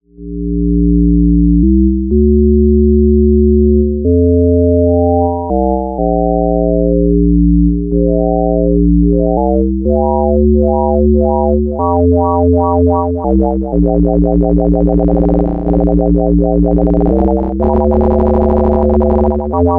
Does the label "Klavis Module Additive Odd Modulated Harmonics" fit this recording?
Yes